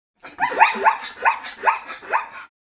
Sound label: Zebra Brays Multiple